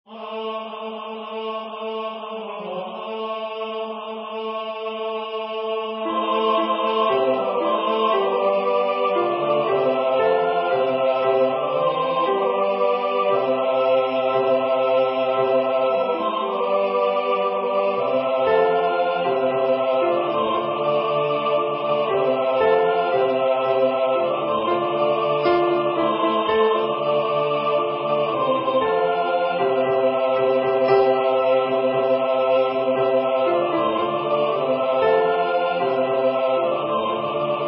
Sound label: Alto; Missa Unitate Gloria